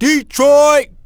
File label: "Pistons", fan; NBA Inside Drive 2004